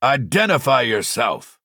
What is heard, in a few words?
midnight sweep